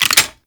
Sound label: Clip Out, rifles; Snipetron